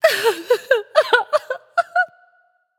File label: boo hoo; smite; themorrigan